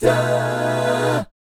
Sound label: B13B5 AA, GUY CHORDS; Spectrasonics; Vocal Planet; Jazz FX